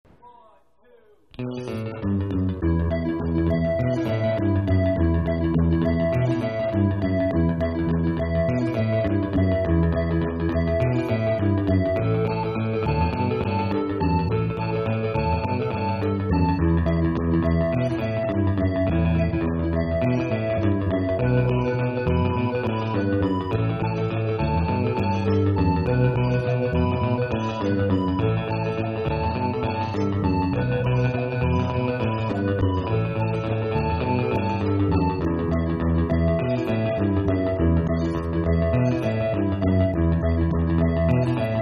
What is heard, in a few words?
14 bar blues